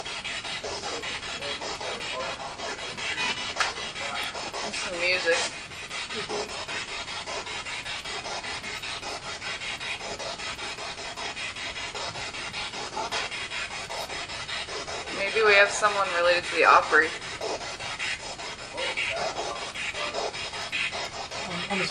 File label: Balcony Spirit Box; twin city opera house investigation